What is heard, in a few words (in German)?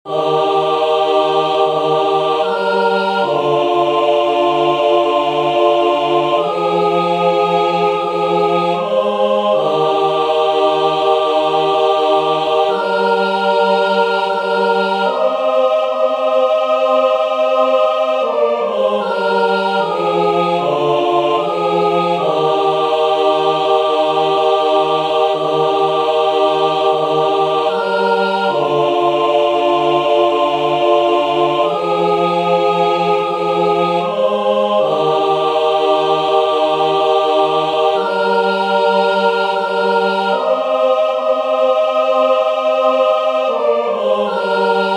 Andachtsjodler